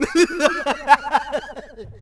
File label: laugh; GTA2